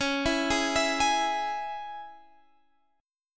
C sharp Minor C sharp Guitar Standard, chord; C#m; arpeggiated; JGuitar

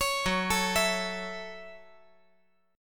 F sharp Minor 7th F sharp; arpeggiated; JGuitar

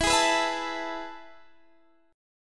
A Major 7th Suspended 2nd

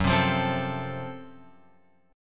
F sharp Minor Major 7th Flat 5th F sharp; F#mM7b5 Chord {2 3 3 5 X 5} strummed

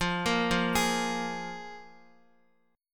F Minor Double Flat 5th, Guitar; Listen to Fmbb5 arpeggiated

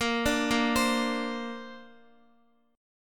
A sharp Major Add 9th, down, Guitar; A#add9; arpeggiated

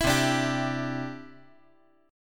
C Major Add 9th, chord

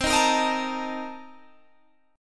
C Minor 13th C Guitar Open G

